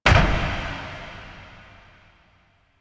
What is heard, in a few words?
Cylinder Start; Warframe; Entrati; Vaults